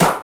DRM OLD, dance synth

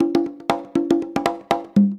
120 BONGO; Best Service Advanced Media Trax Modern Composer

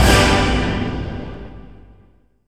HIT G, Synth FX Misc

